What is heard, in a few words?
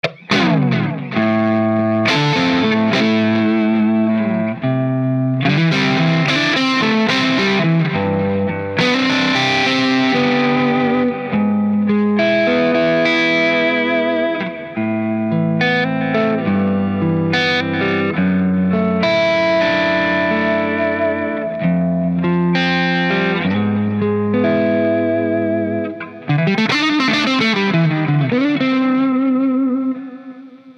Marshall Vintage Modern